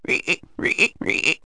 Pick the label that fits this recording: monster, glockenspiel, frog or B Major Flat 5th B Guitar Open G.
frog